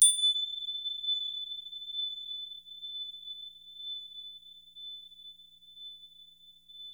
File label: LONG, PERC, Percussionworks; Sampleheads; NYC Percussionworks; 90 s Sample CDs